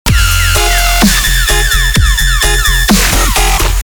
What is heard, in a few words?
wub